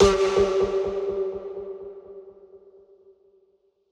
FX Vocal Impact (G); Vocal Impact 3

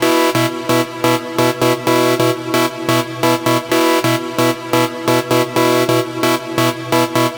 Synth Loop 130 B, Techno; Loops; Synths; GhostHack; Ghosthack - Free Techno Sounds 2024

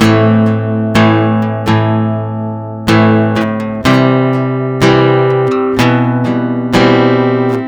Guitar 125 BPM (B Minor), Acoustic Guitar; Campfire; Ghosthack - Free Guitar And Piano Samples

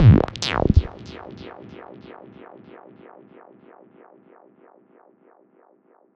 Squelch C 142 BPM, Psytrance; Ghosthack; Psytrance Visions Volume 2

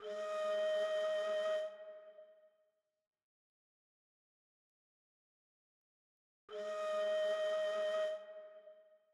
148 BPM G# Min Flute; Stems; Cymatics; Good Vibes

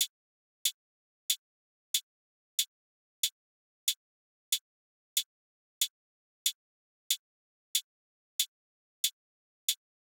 Drum Loop 93 BPM Hihat, Hip Hop; Drum Loops; Loop Stems; Cymatics; Manana Drum Loop

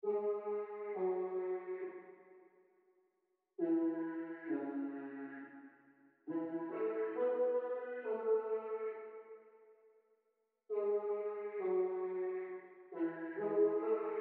135 BPM F# Min Lead; Cymatics; 2022 Melody Collection; Eco

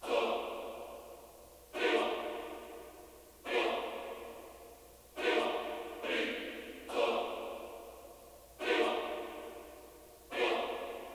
140 BPM A# Min Choir; Loop Stems; Cymatics; 2022 Melody Collection Platinum Expansion - Pt 2; Plug

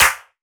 Clap; Titan Other Clap; Cymatics; House Starter Pack

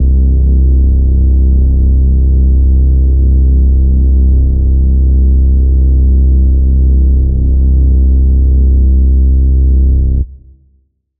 REESE (C); Basses; Faith; Cymatics; Orchid Sample Pack; 808s & Basses

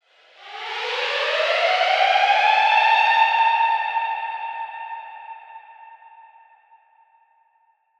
FX - Siren; Hip Hop; Cymatics - 9God Hip Hop Sample Pack

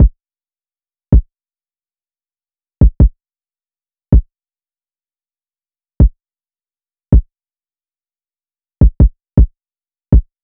Drum Loop, 160 BPM Kick; Loop Stems; Cymatics; Vibes II Drum Loop Collection; Positive

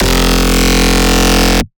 Bass Shot F, Dubstep; One-Shots; Printer; Ghosthack Advent Calendar 2021 - Day 16 - Dubstep Basses

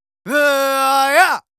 Yells, Viking Vocal

